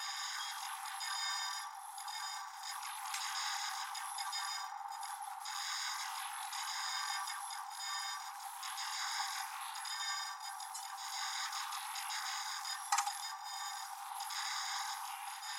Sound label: Console Desk